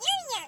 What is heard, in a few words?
yum yum ant